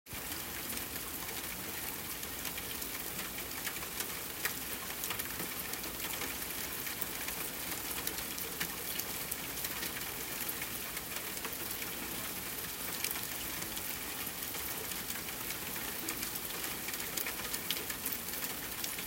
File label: gentle rain for relaxation and sleep